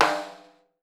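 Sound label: BRAZIL piccolo, Percussion; Voice Crystal - Steve Reid Definitive Percussion; BRAZIL SET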